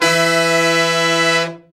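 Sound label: brass section; BRA SECTIONS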